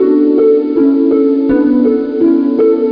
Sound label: Loading Music